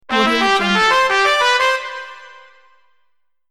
trumpet win

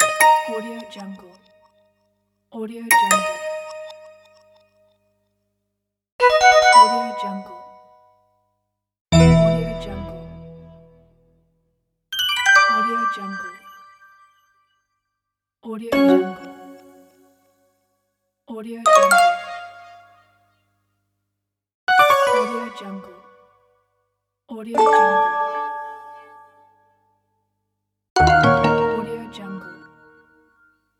mouse sound effects pack

